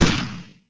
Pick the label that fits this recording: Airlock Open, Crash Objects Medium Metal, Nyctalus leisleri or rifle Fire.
rifle Fire